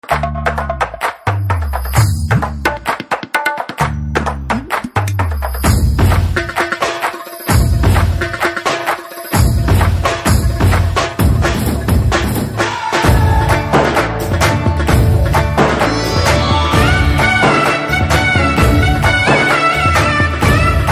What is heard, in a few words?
BG Drum Beats